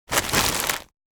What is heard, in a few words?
Shopping bag movements rustling